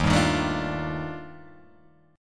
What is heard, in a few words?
D sharp Major Sharp 11th, Guitar, Open G